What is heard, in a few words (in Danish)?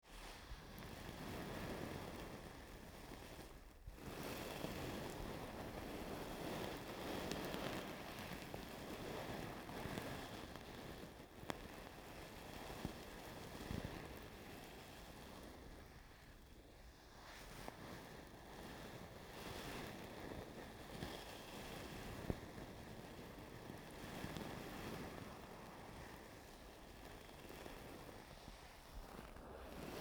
Pude med kamel, stille lyde, contact sounds